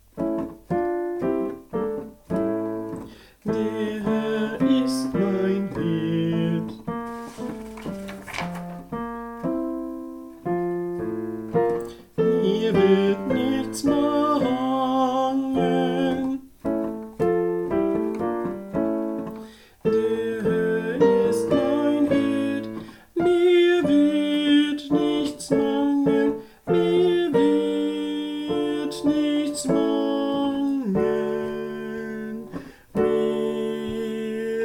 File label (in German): Tenor